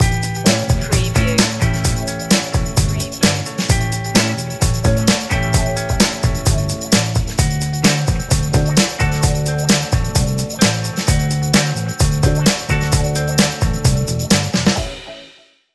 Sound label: funky rhythm